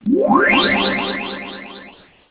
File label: startup; Sanyo; SCP-6400; Startup and Shutdown